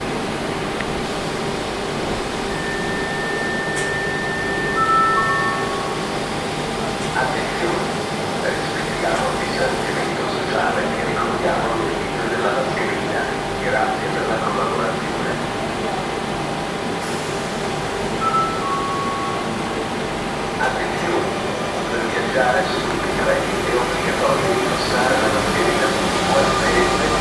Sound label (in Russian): otpravlenie regionalnogo poezda so stancii san benedetto del tronto